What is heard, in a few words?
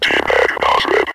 swarm outbreak insector; synthsoldier